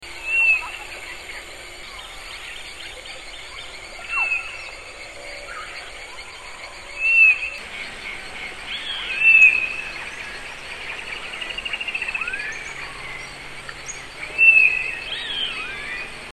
White-bellied Nothura, S. America

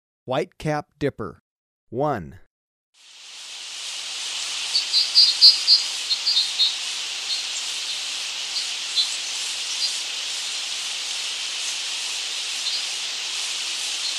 White-capped Dipper, Birds of Ecuador; S. America

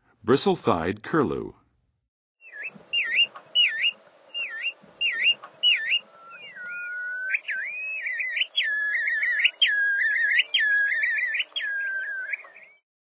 Bristle-thighed Curlew, Birds of North America; Western USA; Western USA Songbirds

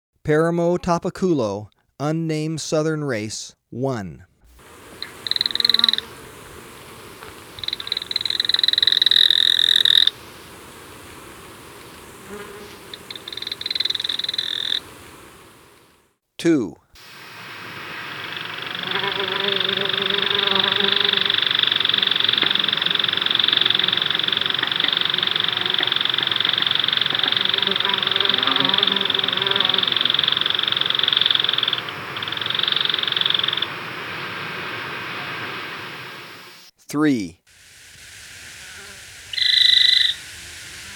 Paramo Tapaculo; S. America

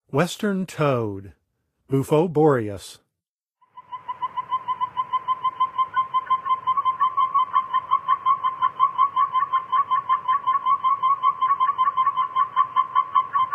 Western Toad; North America; Songs of Frogs & Toads of North America

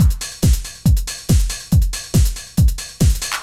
techno 140

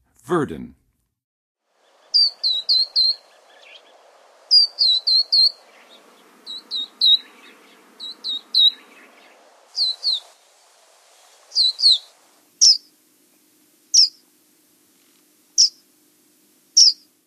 Verdin, Songs of Birds; North America; Western USA; Western USA Songbirds

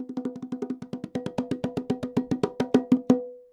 bongos licks, latin hits n licks

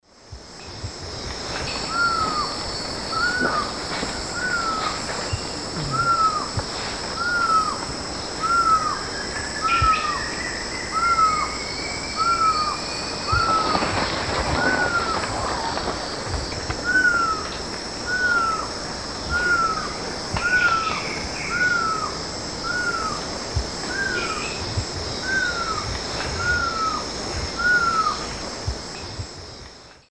Pipile pipile, soft calls; S. America; Eastern Lowlands

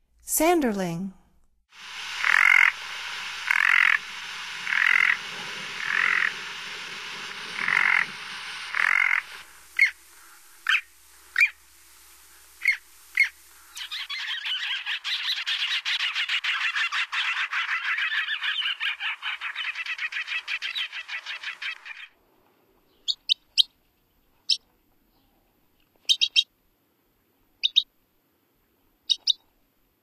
Sanderling; North America; Stokes

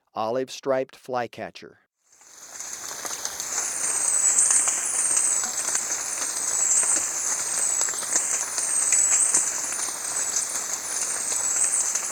Olive-striped flycatcher; S. America

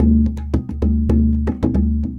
tabla funk 110

